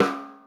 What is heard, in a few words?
snare, Millenium MPS-400